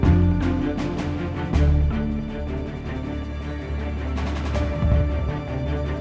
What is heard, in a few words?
Battle Win; Power Rangers Megaforce